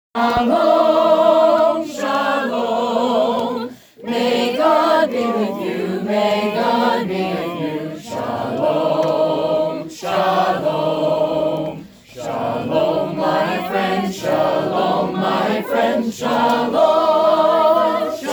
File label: little theater vocal warmup